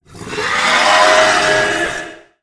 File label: hit; sinfar haks; sf effects